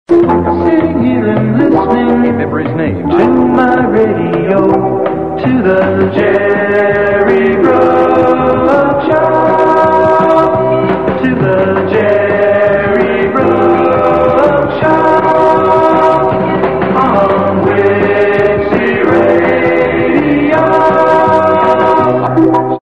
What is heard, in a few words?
NAME JINGLE